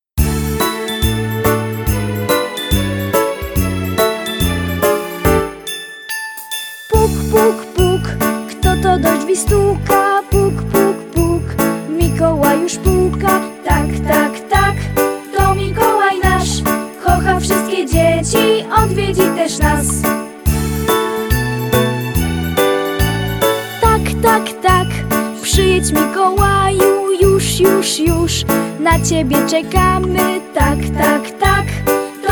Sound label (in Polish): mikolaj stuka do drzwi